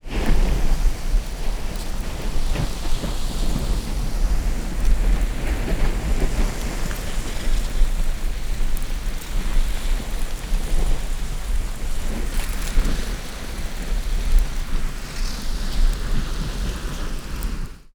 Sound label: lava fields